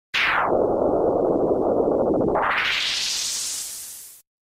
boss death

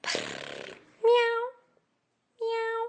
purr

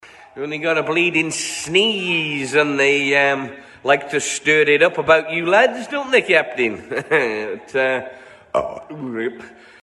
burp

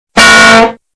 cartoon hammer hit sound loud

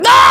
yahi nooooo

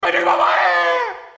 torpedo; raiden

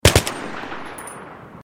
ump burst outside

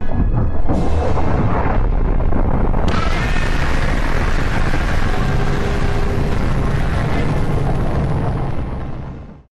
godzilla roar; earth

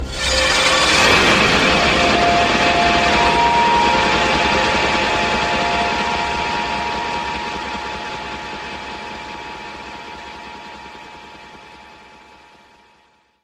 ps1 error startup

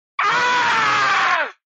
ahhhhhhhh; sword coast legends

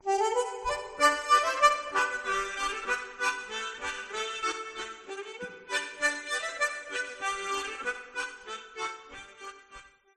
oh susanna harmonica solo

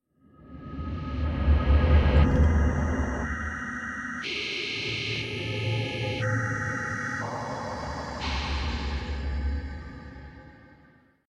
minecraft cave sound

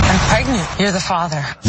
ringtone

